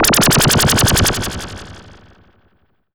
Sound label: SHAKER; AKAI Anime Sound FX